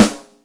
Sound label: Beaty Rim, Yamaha DTXpress Acoustic Snare